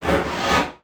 Transition Hit Impact Warble; Production Element

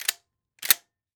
Weapon Gun Shotgun 12 Gauge Pump Slow; AdobeCC

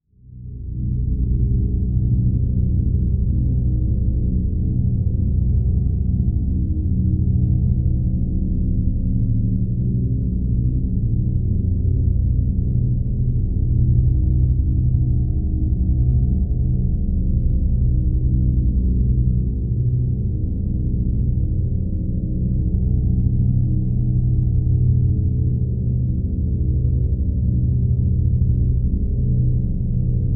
Drone Musical Mysterious Fluttering; Production Element Imaging; AdobeCC

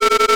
Science Fiction Sci-Fi Electronic Beeps Quick

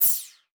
Multimedia Internet CD-Rom Flash Blast

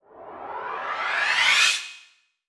Multimedia Internet CD-Rom Flash Whoosh

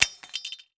Industry Machete Dropping On Cement

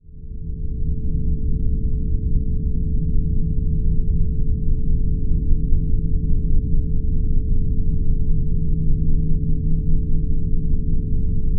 Drone Flange Low Tones Electrical Hum; Production Element Imaging; AdobeCC

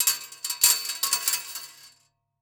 Impact Coins Dropping On Metal Surface

